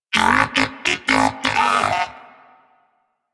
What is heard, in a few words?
Moa Pet Greeting; Warframe; Tenacious